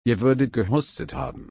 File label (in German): husten